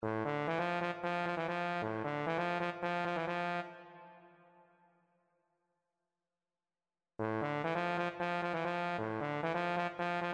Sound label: euphonium